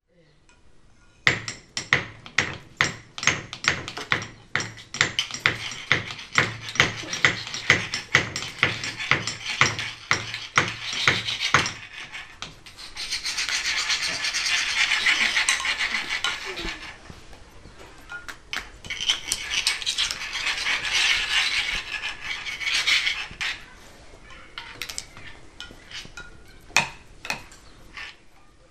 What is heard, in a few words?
repetition jeux doux; nature en musique